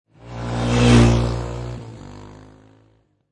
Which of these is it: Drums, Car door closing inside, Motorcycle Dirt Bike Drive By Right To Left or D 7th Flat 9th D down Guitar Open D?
Motorcycle Dirt Bike Drive By Right To Left